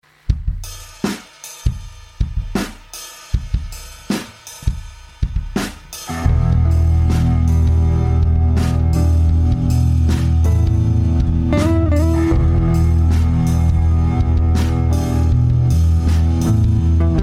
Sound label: Clean Slow